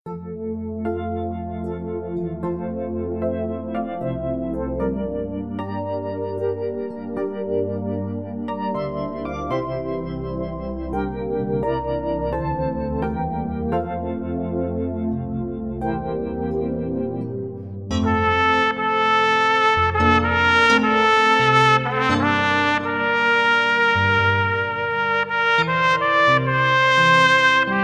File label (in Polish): santa maria madonna 1 trabka